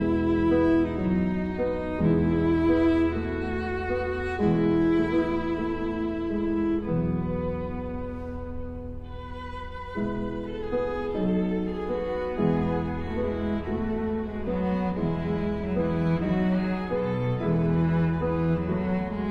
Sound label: Piano Trio; Yon, Pietro A.; Gesu Bambino